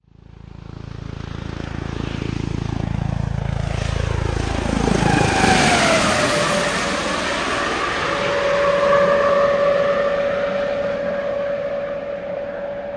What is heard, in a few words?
sea helicopter fast fly by; Sound FX Real Life